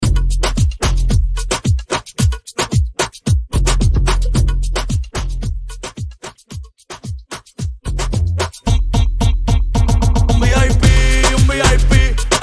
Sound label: intro outro break 111 BPM